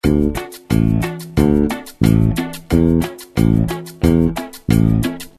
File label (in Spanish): Patron bajo cumbia; how to play cumbia bass